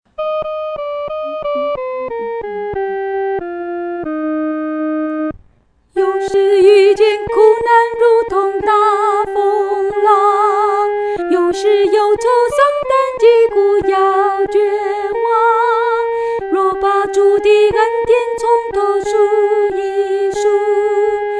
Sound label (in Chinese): 独唱 第一声